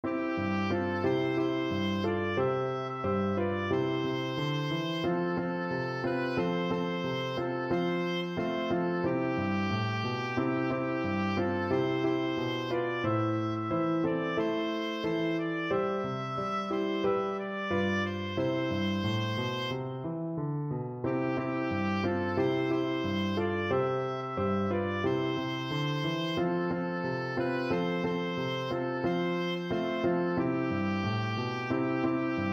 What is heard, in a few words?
do lord, oboe